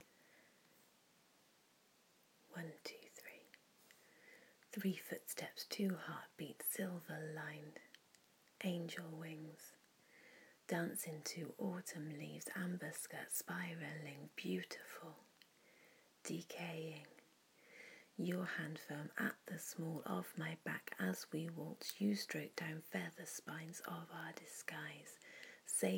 three footsteps, A waltz

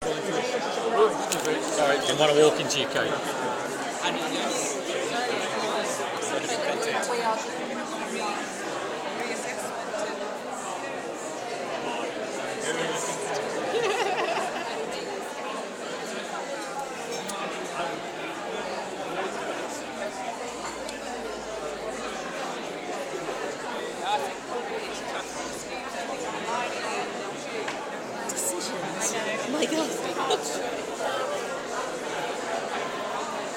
atmosphere at museumcamp cake table

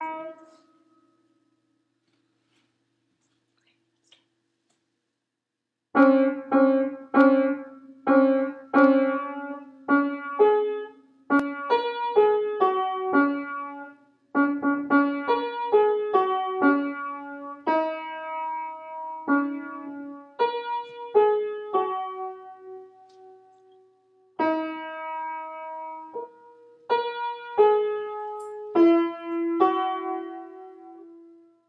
jingle bells

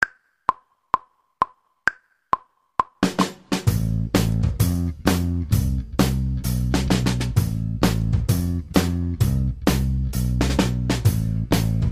bass main riff

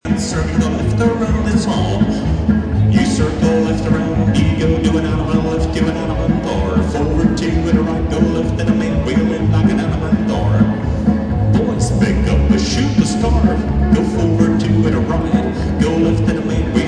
square dance

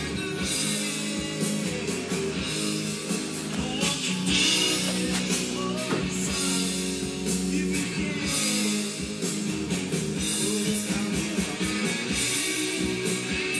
country rock

